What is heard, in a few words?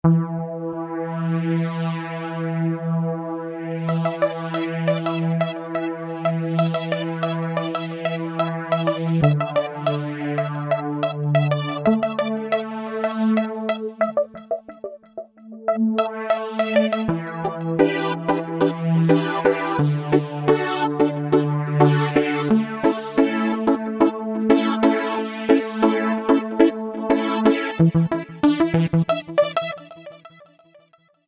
Saw Phase; Novation UltraNova; Factory Patches